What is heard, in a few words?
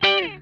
COOL HIT, Funk Guitar; Zero G - Funk Guitar